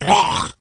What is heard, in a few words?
murloc tidehunter death